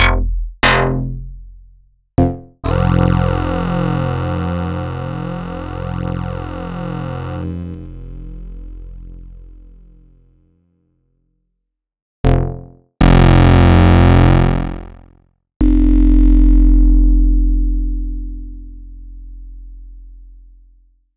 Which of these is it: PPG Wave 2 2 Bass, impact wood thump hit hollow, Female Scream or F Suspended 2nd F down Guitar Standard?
PPG Wave 2 2 Bass